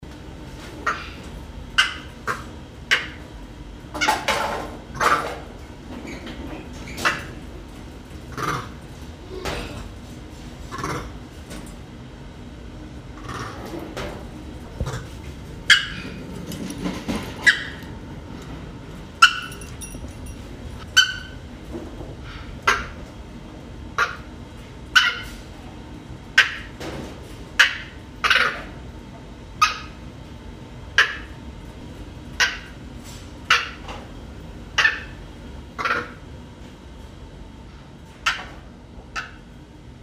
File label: male cyno 6yo; six-year-old male Cynomolgus Macaque reacting to microphone